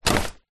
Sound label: foley jacket safety thick fluro hi vis drop wood floor